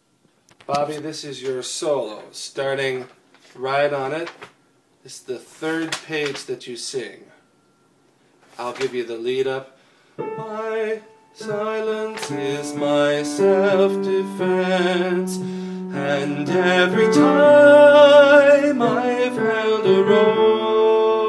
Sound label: solo